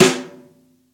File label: roomy snare c key